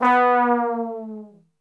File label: B 1X TRBL OF, Brass; Best Service; Brass Super Section; 90 s Sample CDs